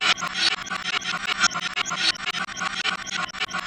Metal Weird Loop; horror and atmosphere fx; Silent Hill Downpour

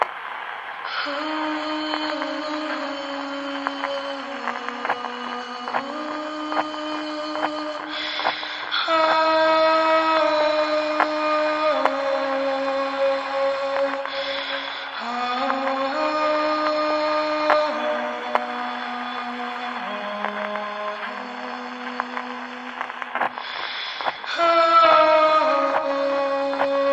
Choir (Gramophone) Loop; Silent Hill Downpour

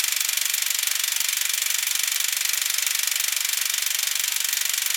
Projector Loop Rusty; Silent Hill Downpour

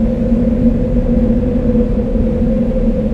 Cave Upper Humming Loop; weather fx; Silent Hill Downpour

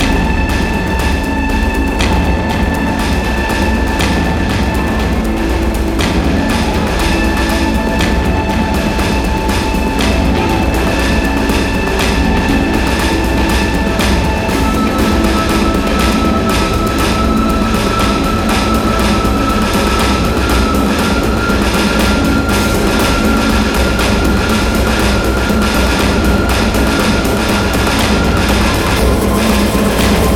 Fork Loop; Silent Hill Downpour

